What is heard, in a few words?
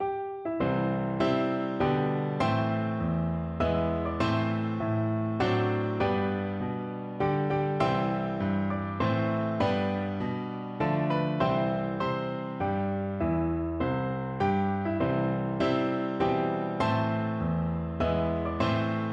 star spangled banner piano duet